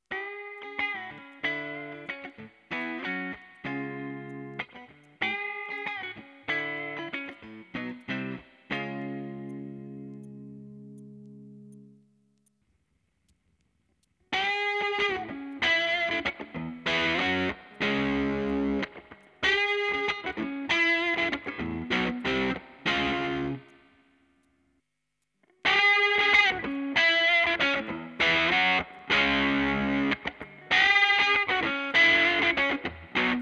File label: danelectro daddy o; Danelectro Daddy-O Overdrive with Marshall Guv'Nor mod; Recorded with a Fender 62 Relic Strat middle pick up, Peavey Classic 50 tube amp on clean channel; Dexter Mod Pedals